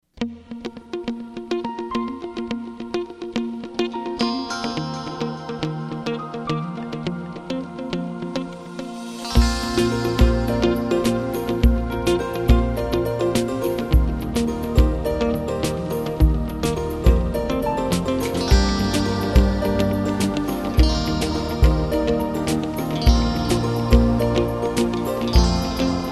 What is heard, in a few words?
Happy Harp